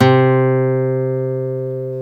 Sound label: GTR Nylon, Nylon Martin; Club-50 - Foundations Roland